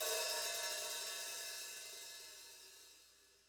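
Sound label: Hi-hat Custom Half Open Soft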